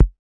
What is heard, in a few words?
kick, drums single hits; iElectribe Kicks